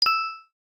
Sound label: doremi zero, keytone; SKY; IM-A830S VEGA Racer 2